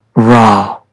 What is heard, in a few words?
rawr